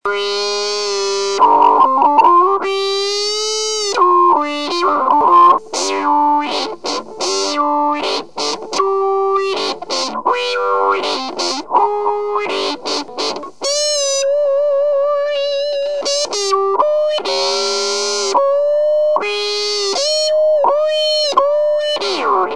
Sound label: Wah wah